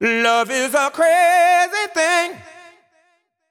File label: deep house male, 125, A, vocals